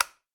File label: household switch light flick; sound effects one